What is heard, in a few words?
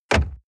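sports car interior glove compartment close short; verkehr-transport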